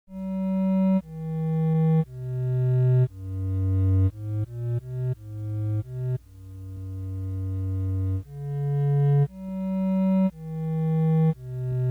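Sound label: bicycle built for 2 arrangement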